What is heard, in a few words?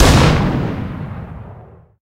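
shot rifle cannon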